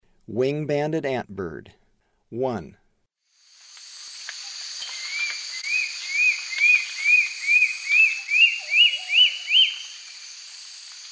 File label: Wing-banded Antbird; S. America; Eastern Lowlands